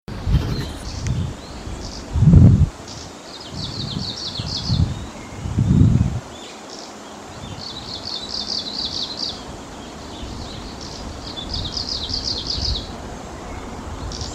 オオムシクイ

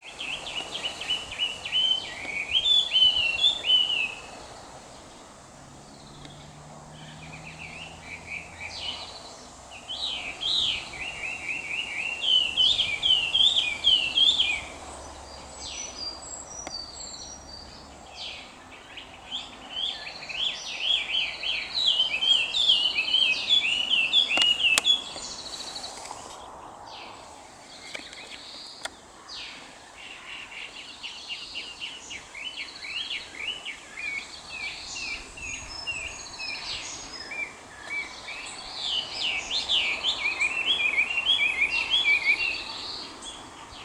ガビチョウ

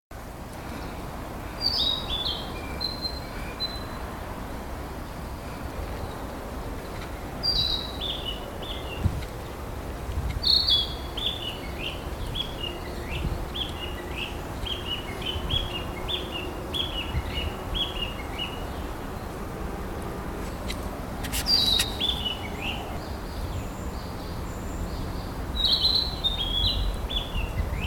kibitaki

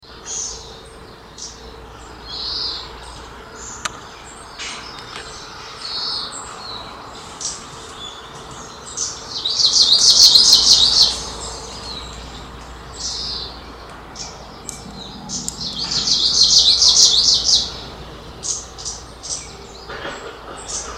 oomushikui

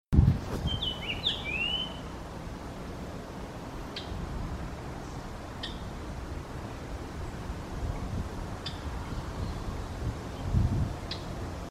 イカル

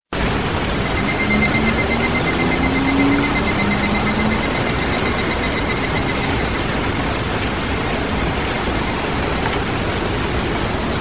ヒグラシ

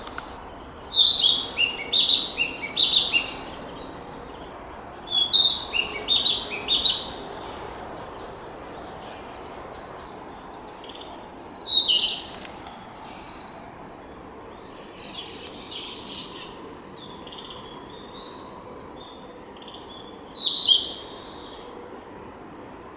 kibitaki

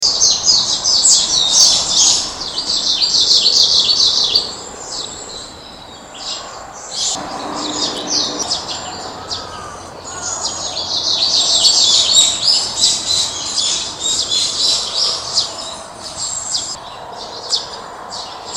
oomushikui

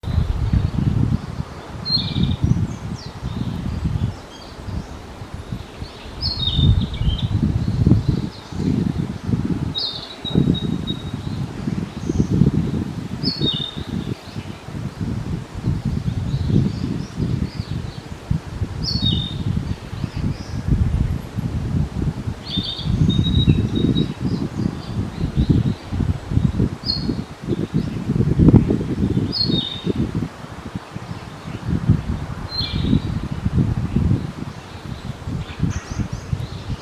キビタキ

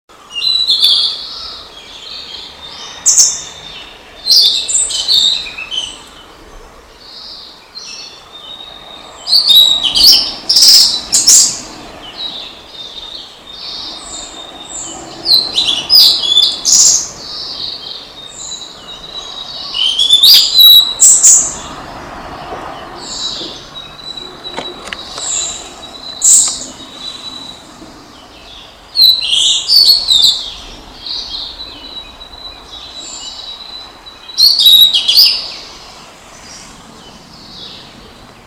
ooruri

